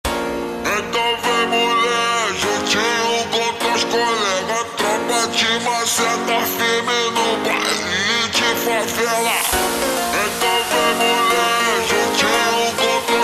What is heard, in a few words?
super Slowed, funk